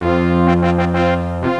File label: music